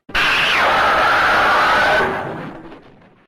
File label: Gyaos Roar; Showa